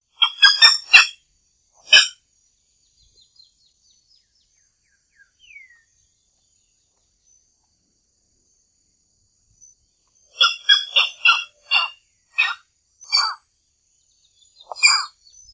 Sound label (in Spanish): garcita azulada